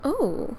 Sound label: Oooh